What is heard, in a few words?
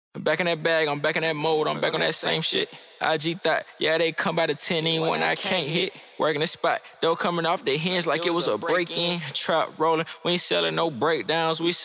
ACAPELLA